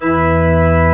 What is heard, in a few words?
E2, CHURCHY; East West; Global Sounds